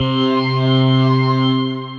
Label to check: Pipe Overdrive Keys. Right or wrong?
Right